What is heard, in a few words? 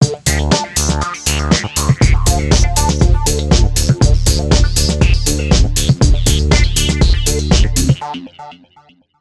120 bpm free techno